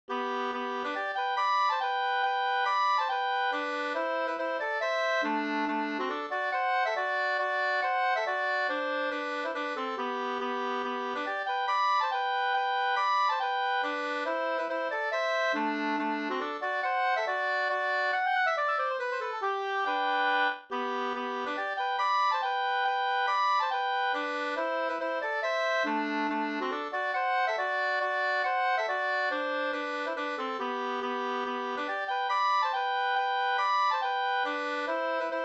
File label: mazurka; Flickan med polkaharet 2st